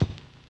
RAGGA; Spectrasonics; Metamorphosis; RAGGAVYNL

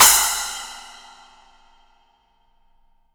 FX CYMBALS; Sampleheads; Peter Erskine Living Drums

